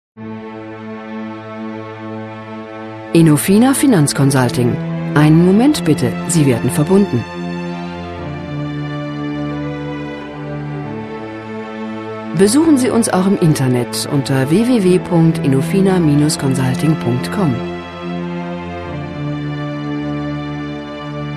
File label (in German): Warteschleife; Inofina